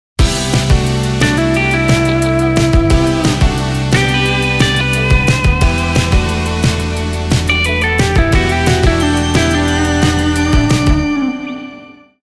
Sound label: guitar pattern